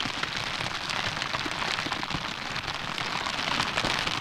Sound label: FIRE Campfire Calm; loop mono; UltimateFishing